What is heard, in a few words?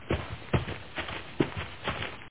Walk; Five Nights at Freddy's The First Generation